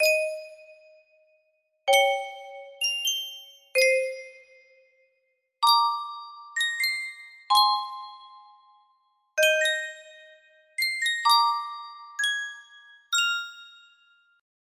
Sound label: sankyo custom tune music box, music box melody